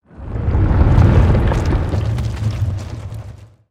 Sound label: Earth Quake Rumble Rock Slide; ninjago